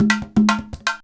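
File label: emelabo grooves; Best Service; World Colours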